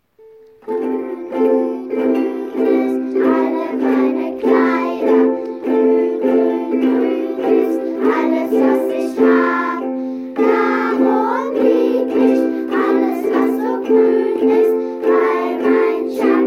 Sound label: Ukulele